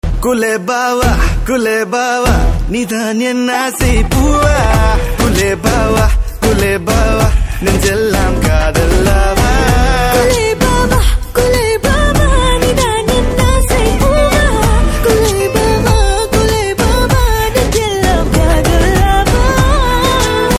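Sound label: Ringtone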